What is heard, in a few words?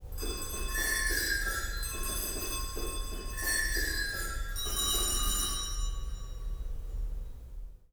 tuned sleighbells